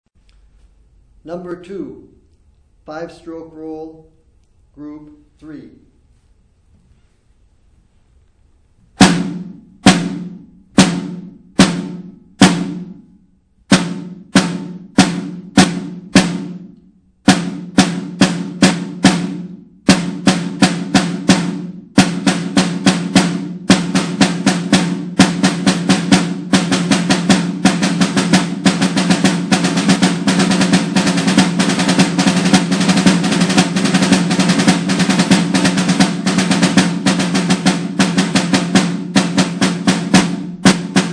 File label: snare rudiment